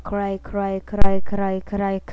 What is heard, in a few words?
cry